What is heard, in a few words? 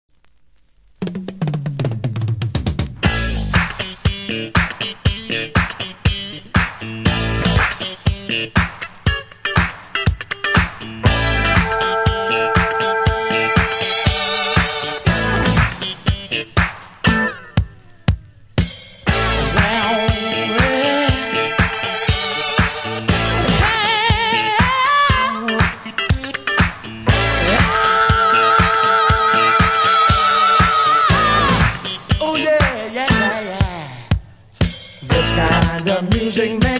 Funk, RARE FUNK, boogie